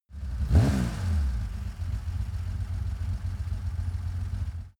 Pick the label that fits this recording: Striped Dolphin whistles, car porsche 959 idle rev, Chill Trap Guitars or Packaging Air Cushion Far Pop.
car porsche 959 idle rev